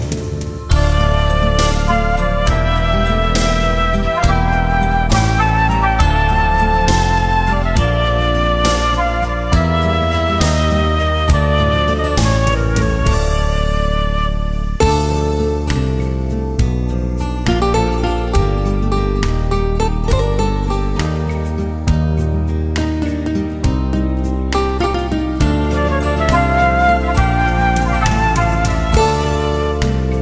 Melody

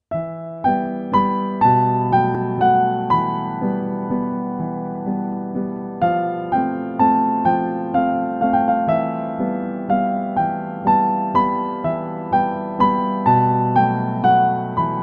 Piano

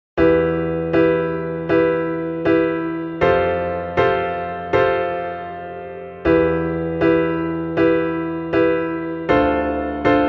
Piano

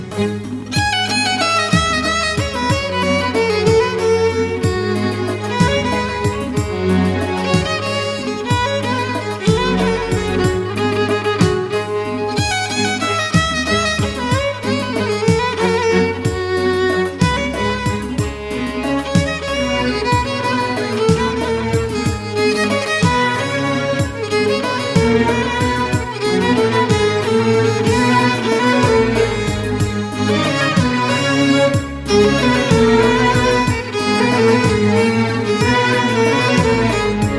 Violin, play back

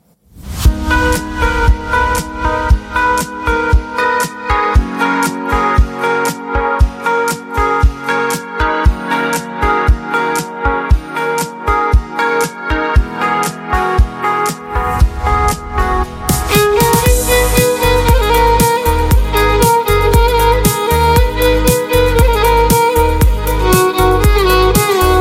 Violin

